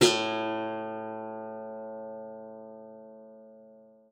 A2, guitar; Xsample VOL-2

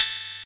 Crash; Zero-G; Soundclash

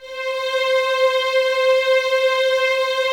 Violins Soft C 5, String Ensembles; Miroslav Vitous; 11 Violins

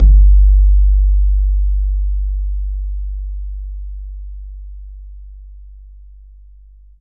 BAYAN; Q Up Arts; Denny Jaeger Private Collection

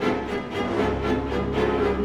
Orchestral Loops

